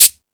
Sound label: Timbale, E-MU Pro-Cussion